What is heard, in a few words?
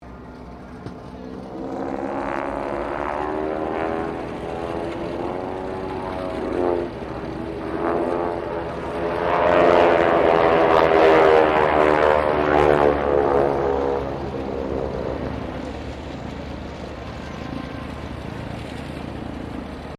AIRCRAFT UNKNOWN PROPELLAR SQUADRAN PASS